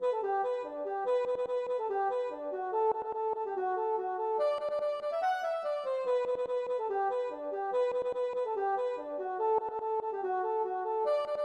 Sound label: polka